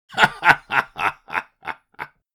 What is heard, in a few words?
Elderly man laughing